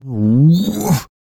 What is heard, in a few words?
Man Frustrated; Zomboid; Lifestyle